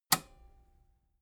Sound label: metal button robust spring release